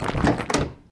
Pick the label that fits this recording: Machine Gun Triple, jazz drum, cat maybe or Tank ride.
Tank ride